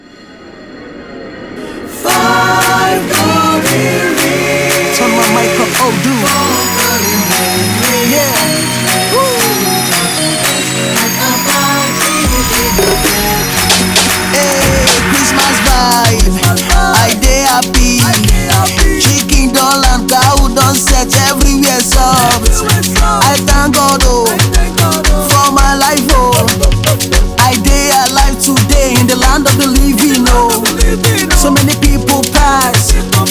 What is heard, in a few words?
Christmas Holiday Vibes